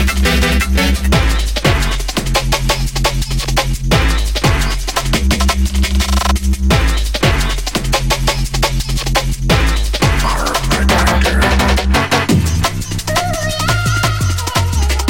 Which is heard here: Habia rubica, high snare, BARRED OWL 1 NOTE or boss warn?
high snare